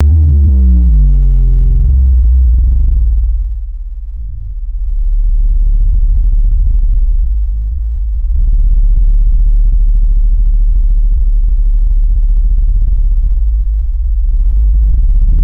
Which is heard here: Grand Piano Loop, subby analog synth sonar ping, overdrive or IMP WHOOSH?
subby analog synth sonar ping